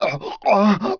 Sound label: breathe; PC Gamer IT CD 41; Daikatana